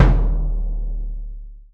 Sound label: Bd Punchy; Torchlight III; PS4